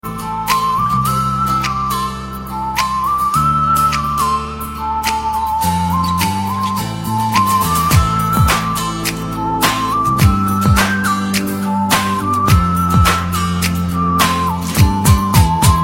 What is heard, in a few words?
vissile, نغمة رنين موسيقى وصفير; رنة هاتف رمنسية